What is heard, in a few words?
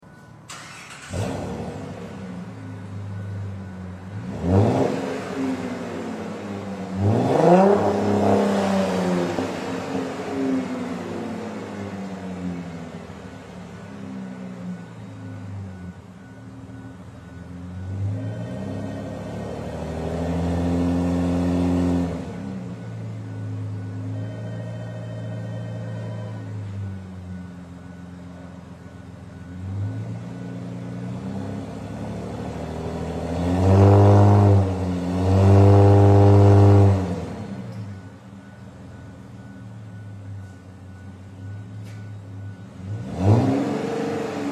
Charger 6cyl. S-Turbo and dual tailpipes, exhaust sounds